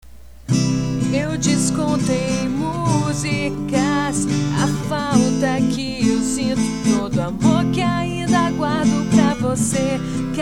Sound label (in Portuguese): violão e voz